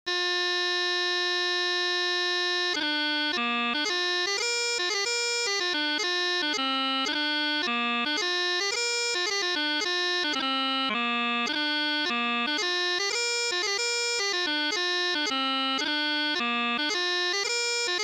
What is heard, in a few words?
Highland Fling Chanter